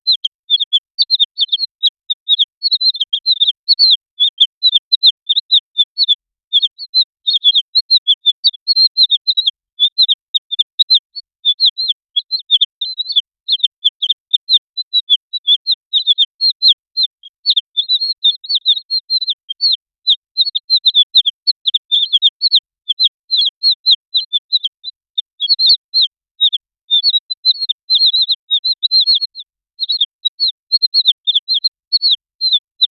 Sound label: synthesized chicken garde; garden